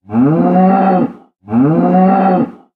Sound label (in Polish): Krowa muczenie; minecraft